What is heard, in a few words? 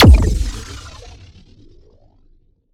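Sci weapon Shot Medium Blaster Pounder; Robobeat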